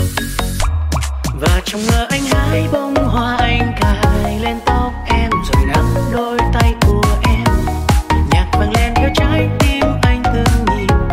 Nhac Chuong Remix

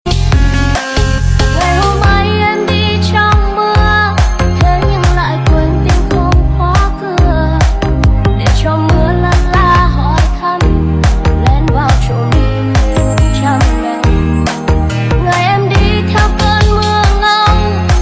giong nu remix

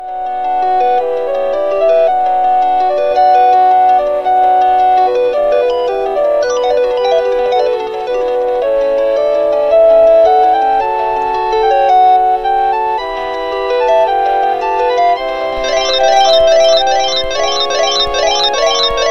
bao thuc iphone dac biet

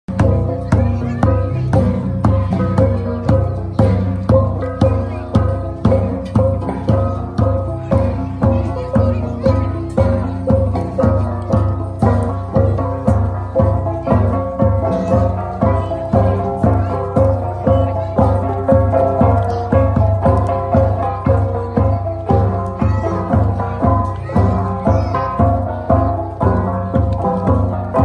tiếng cồng chiêng tây nguyên

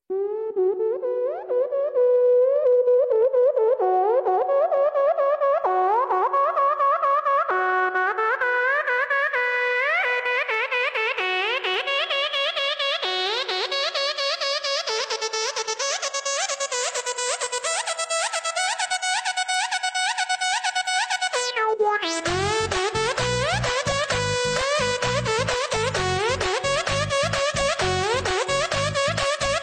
nhac chuong khmer remix old school

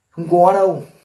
bip

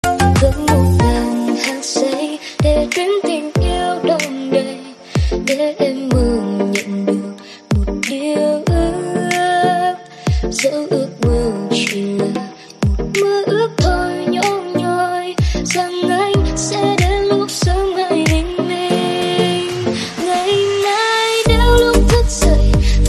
nhac chuong remix

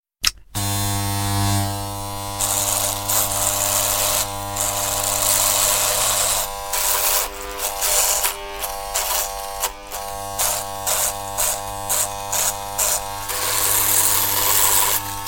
am thanh cat toc bang tong do

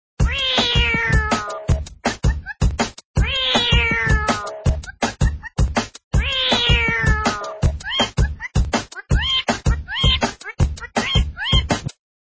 nhạc chế techno

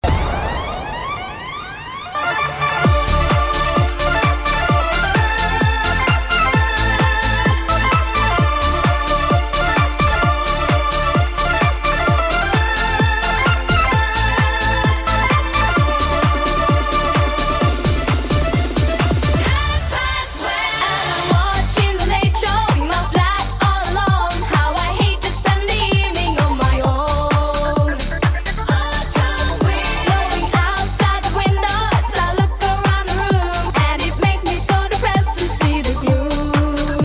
Techno Remix Nhac Chuong

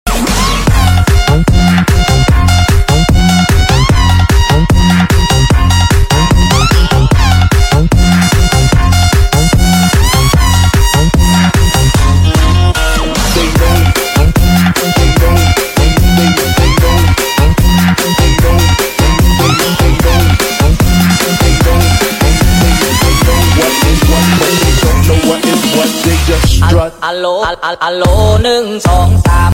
nhạc chuông alo alo remix ringtone

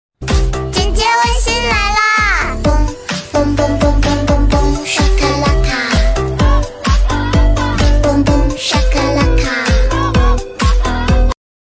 Remix Tiktok Trung Quoc

